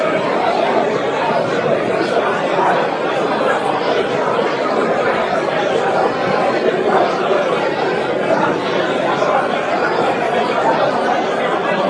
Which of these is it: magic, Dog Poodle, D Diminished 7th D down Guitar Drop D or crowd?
crowd